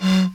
Blow; gnustep

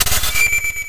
sword armour; mazeofgalious

